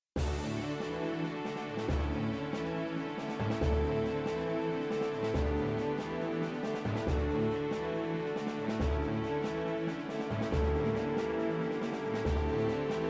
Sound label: boat; PhoenixCraft-1.16.5; ambience music